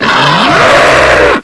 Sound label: bullsquid attack growl; hl2